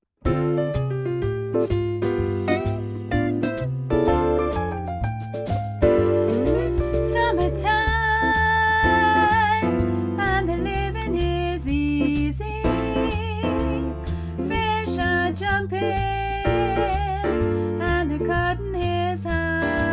alto

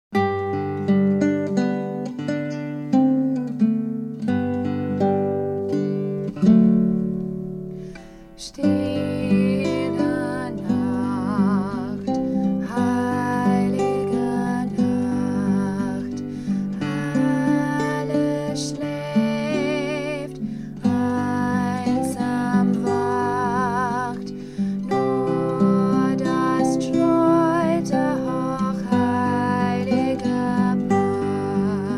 Stille Nacht alto, choir

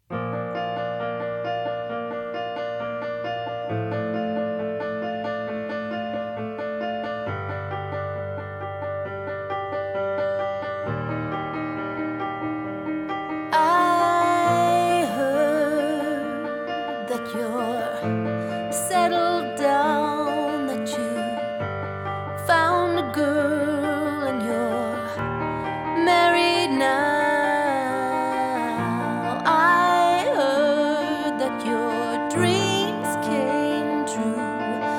tenor

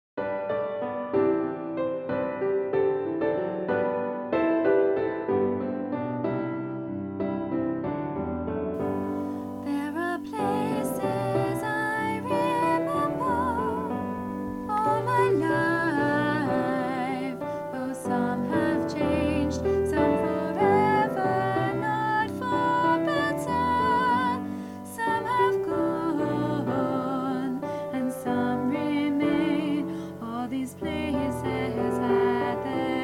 tenor

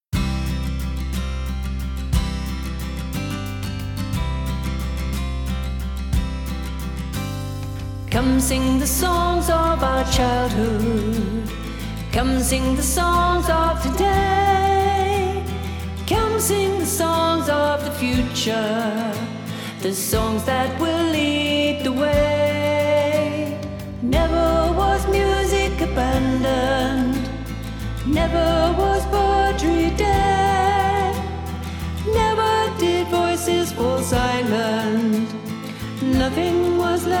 SSA sop

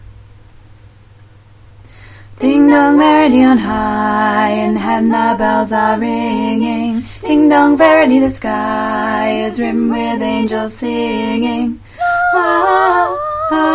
tenor bottom part

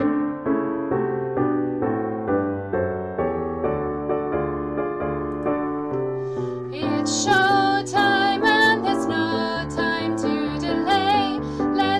with vocal

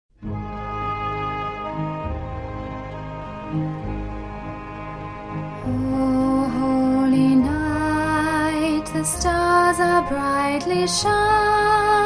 alto middle part

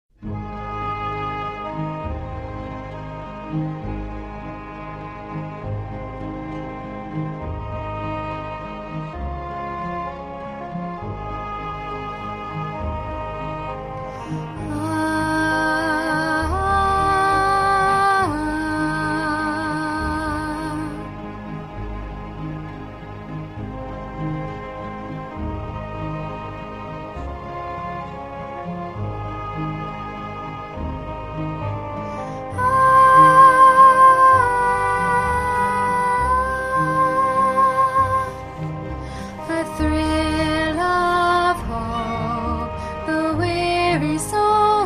soprano top part

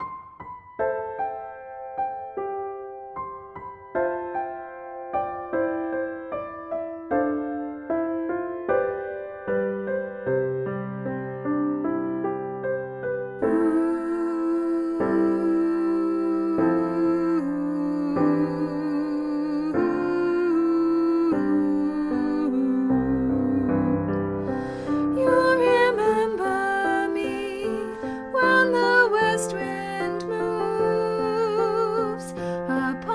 alto, choir

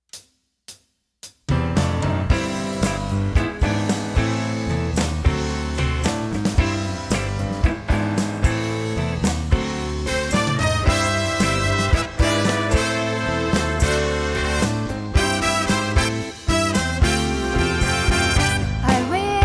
soprano

